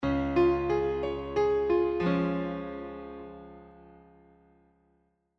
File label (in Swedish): Tonartsgivare C Db